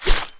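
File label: lightning-fast accurate hit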